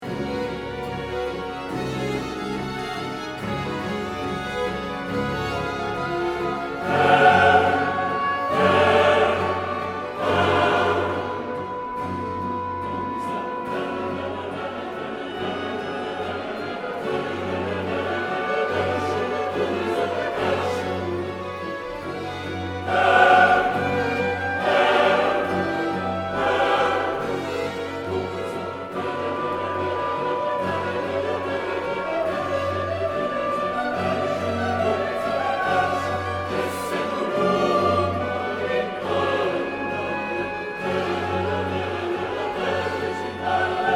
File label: Chorus; Herr unser Herrscher